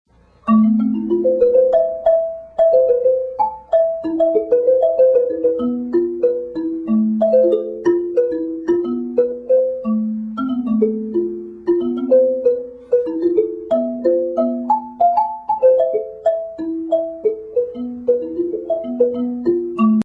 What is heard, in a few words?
A Minor Imbarimba